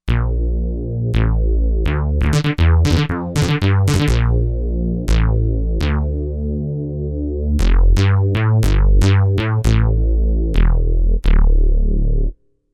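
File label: Bass Ana Chorus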